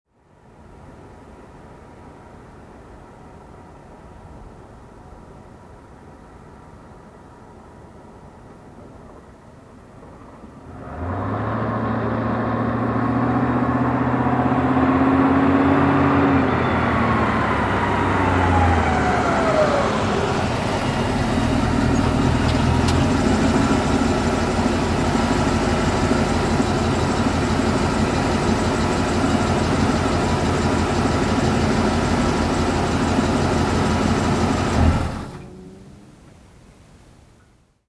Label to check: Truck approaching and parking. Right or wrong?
Right